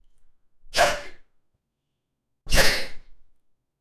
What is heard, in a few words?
jumpscare of dead man